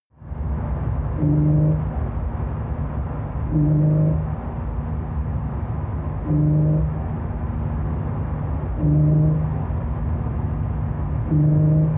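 sports car interior engine drive short thrusts increasing rpm low; verkehr transport; autos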